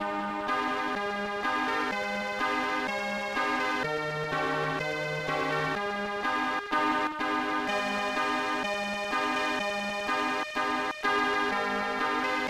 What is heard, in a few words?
National Anthem of Monaco, SNES; Nigel Mansell's World Championship Racing